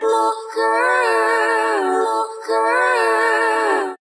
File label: vocal